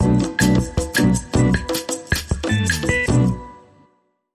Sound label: Clean Sting